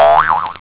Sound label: HARPOON; pang